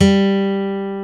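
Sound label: acoustic guitar, country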